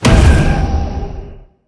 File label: fire; smite; phoenix